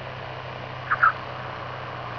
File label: tires; skyarrow